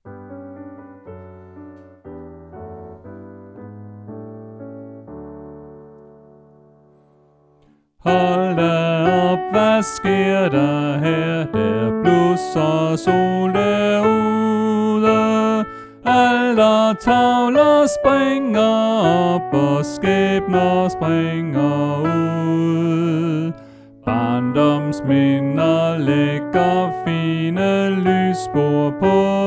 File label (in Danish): Sopran; Påske